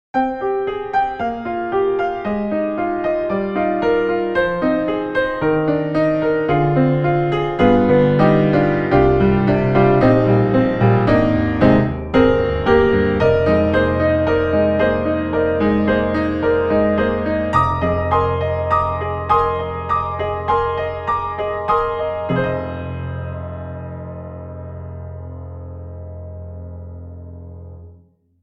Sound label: Virtual Grand Piano, Vintage Pop; Art Vista; Virtual Grand Piano 3